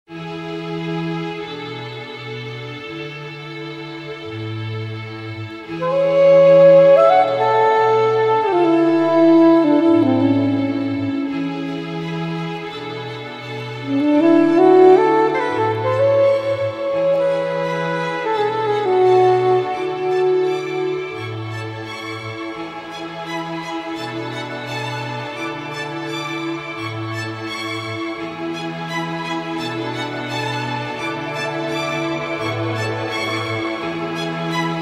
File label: sax